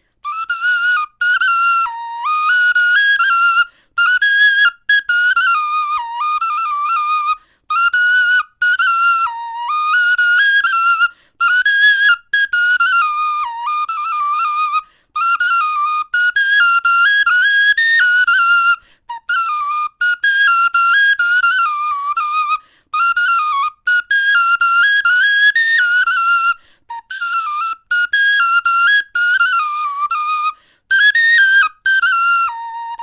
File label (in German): melodie, knochenfloeten